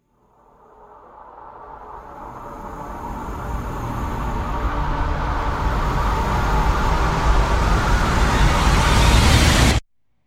risers into the void

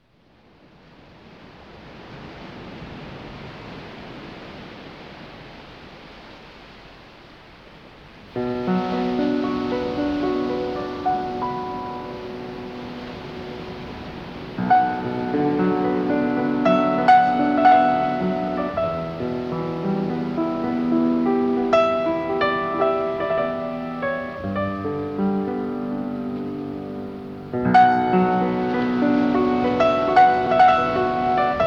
ocean melody instrumental

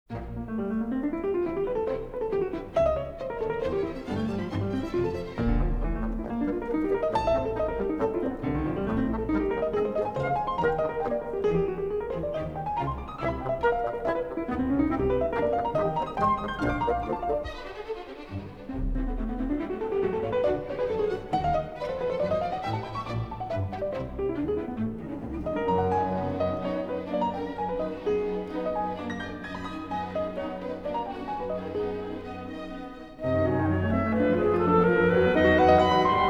piano concerto no 4 in b dur vivace; sergei prokofev; op 53

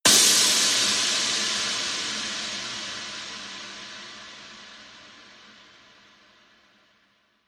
barbed wire; brand x music

